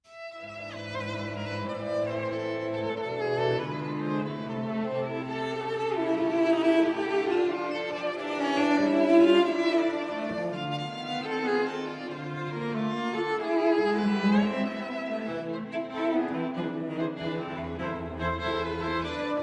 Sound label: String Quartet in A Minor, Allegro